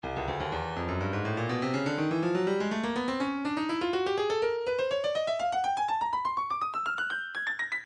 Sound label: breakbeat 123 bpm; Backslap B; Stylus Breakbeat Midi Files